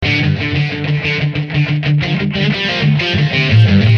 D 120 rhythm

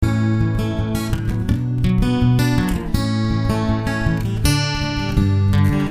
Picking A,Bm,A,D

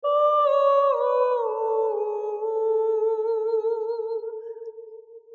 Classical 75-105

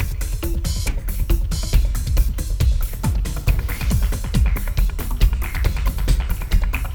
Clubbin' 138

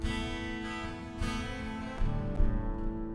Strummed Am 77

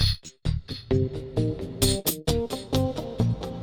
Funky 125-132 Cm7

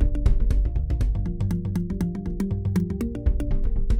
Mallimban Gm7 120

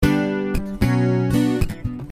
Punchy G,Bm 120

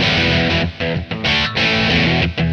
E4 95 chords

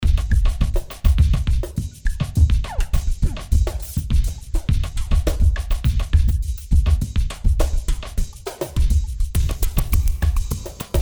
Latin Dancer

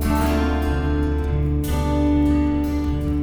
Picking G

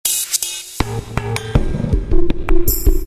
2 Step

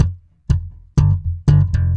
Bb 120 Funk Rock

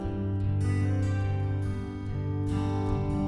Picking Em 75

